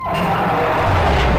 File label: speaker scream; titan